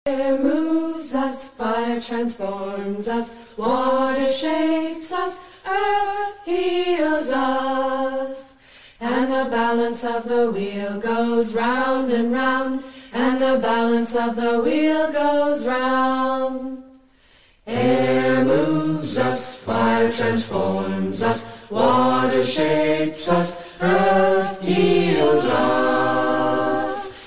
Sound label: Wiccan chant